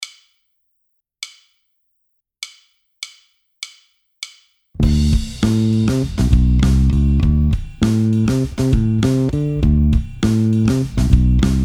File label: bass phrase